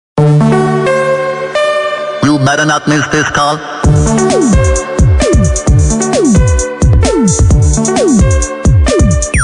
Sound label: Hindi Electro